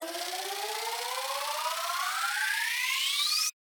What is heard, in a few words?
freespin Count up Counter; turn your fortune